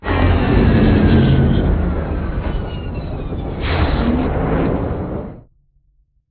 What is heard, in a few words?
strafe far; Beacon; Titanfall 2